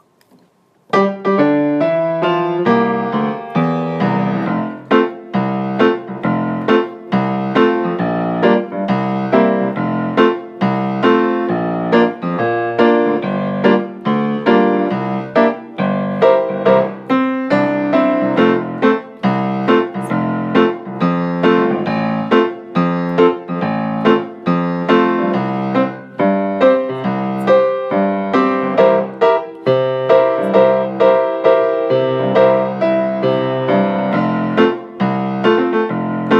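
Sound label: accompaniment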